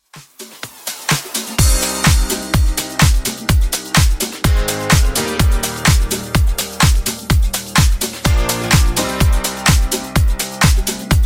Funk Vocal Edit Clean 126Bpm

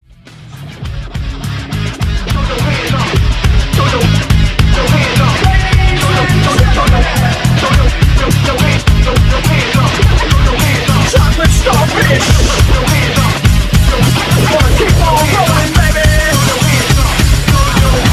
Extended Rock 102 BPM

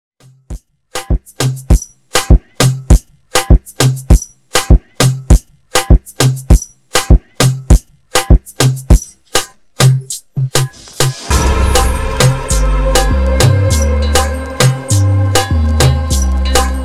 Moombahton 100Bpm

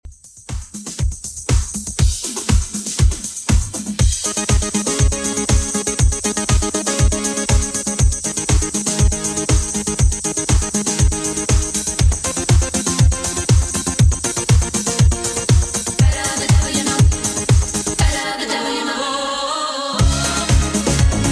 90s Redrum 120bpm

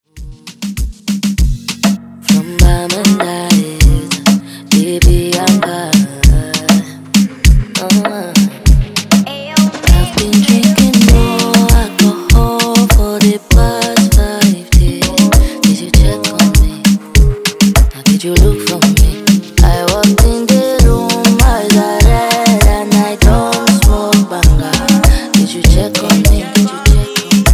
Afro Rton Redrum Intro

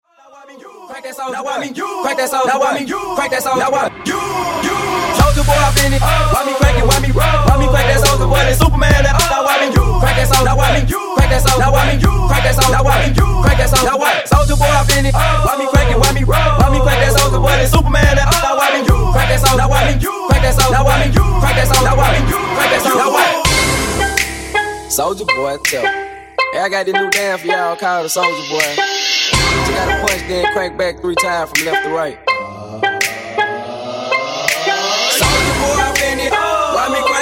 Acapella Intro Moombahton Twerk Rework 105Bpm

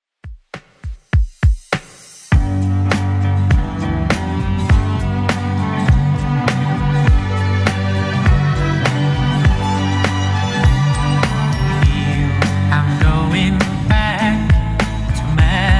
60s Redrum, 101 bpm

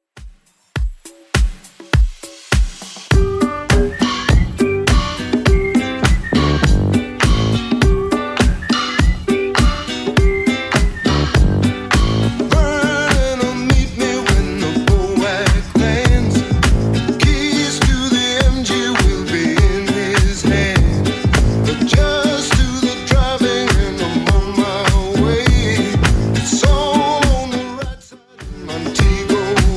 70s Redrum, 102 bpm

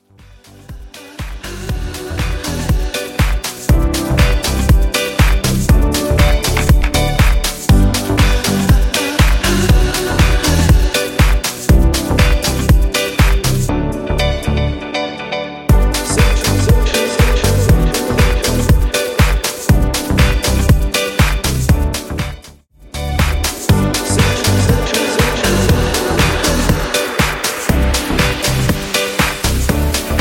Deep House 120BPM

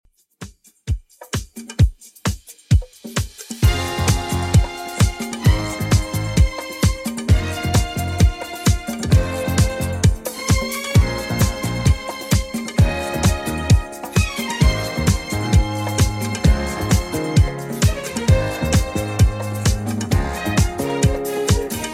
70s Redrum 131bpm

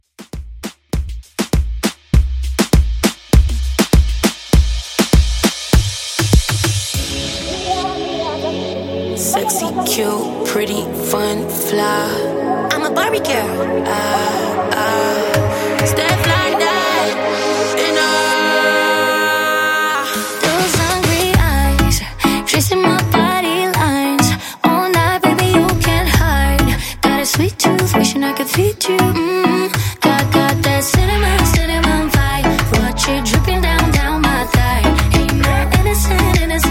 Club Moombah, 100Bpm

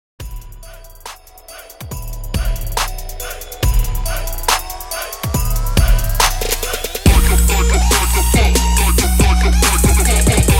XMAS TRAP, 70 BPM

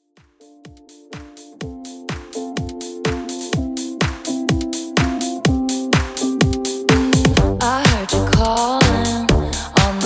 Re Drum 125Bpm

